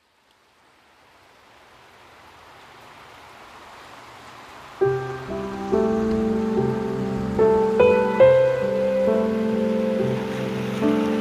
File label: Piano and Rain